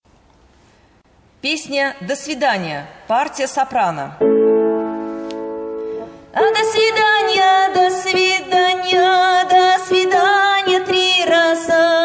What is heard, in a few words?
soprano; Do svidania